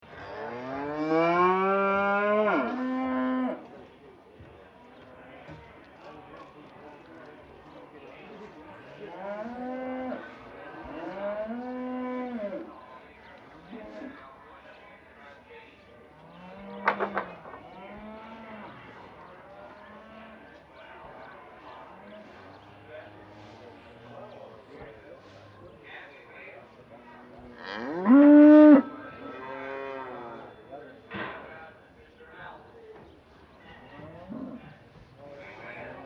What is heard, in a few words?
Cows Waiting At Auction; Animals